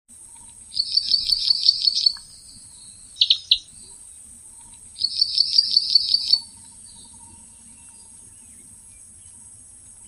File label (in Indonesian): suara asli perenjak padi